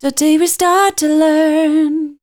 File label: voice spectral, ly short; Best Service; Voice Spectral II